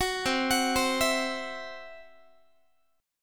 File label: C Major Flat 5th Guitar; CMb5 arpeggiated